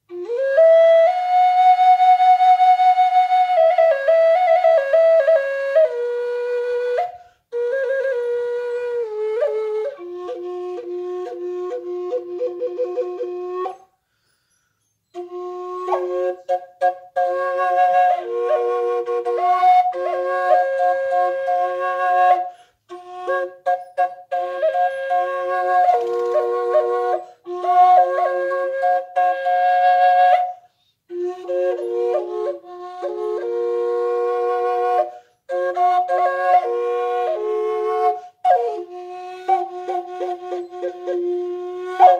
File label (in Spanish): flauta Ancestral en Fa F; con totem de aguila